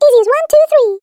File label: game correct